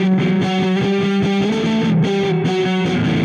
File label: Dirty Chunk Guitar, Indie Rock